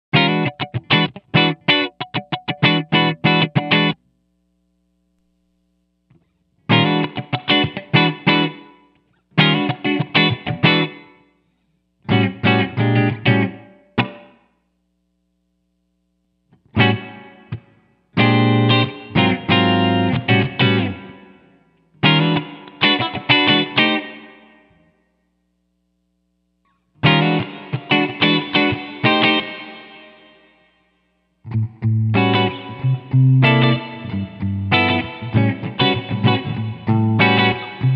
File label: plate; BlueSky